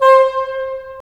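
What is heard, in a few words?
WND BN, wind bassoon; LA Composer vol. 3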